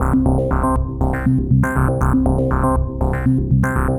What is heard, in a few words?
Synth